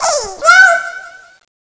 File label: bomb nuke; CSO LightZombie